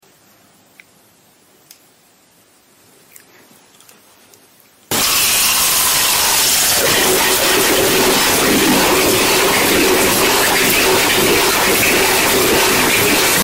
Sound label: asmr